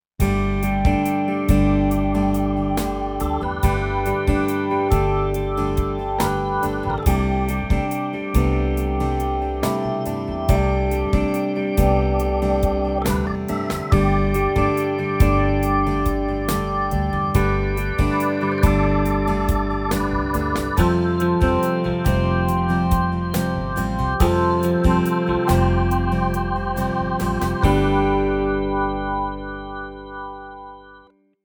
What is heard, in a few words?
Org XS Ballad mix